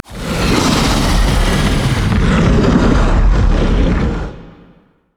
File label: spider idle; LoL